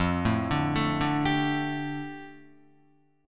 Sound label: F 6th Add 9th, Guitar, F6add9 chord; F6add9 arpeggiated